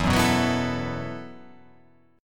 E Suspended 2nd Suspended 4th E, down; strummed; JGuitar

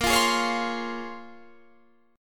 A sharp Minor 9th, down, Guitar, Standard; strummed

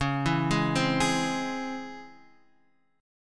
C sharp Minor Major 7th C sharp, chord; arpeggiated; x,4,2,1,1,4

